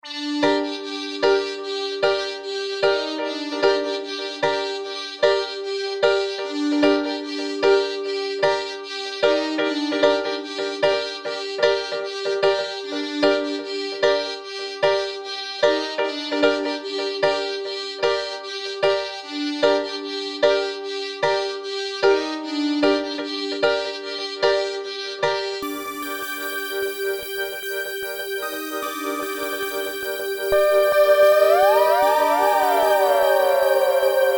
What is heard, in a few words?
Dub Remix